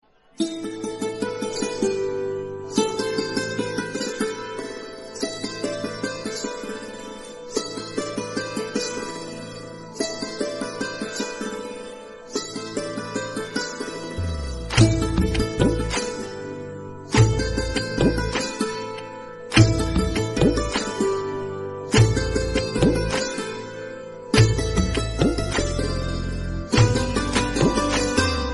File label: kashmiri ringtone instrumental ringtone